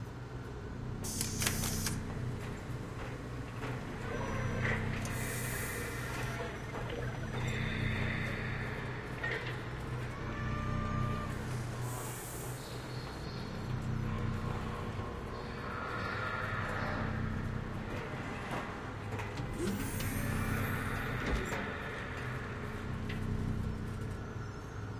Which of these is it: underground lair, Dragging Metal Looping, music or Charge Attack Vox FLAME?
underground lair